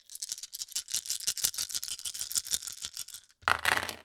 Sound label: Dice Rolling